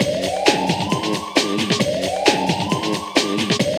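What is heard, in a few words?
drum loop; X-Static Goldmine 3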